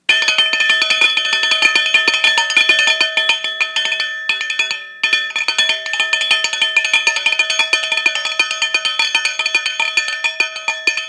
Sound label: Bell Triangle Constant; Bells; Musical